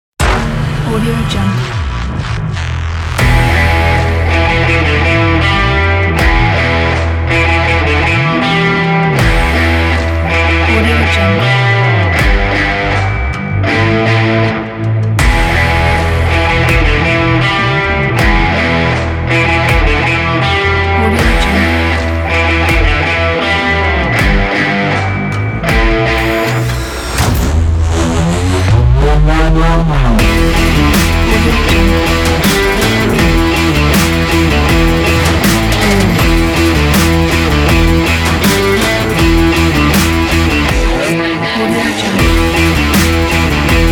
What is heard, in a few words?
stylish trailer powerful rock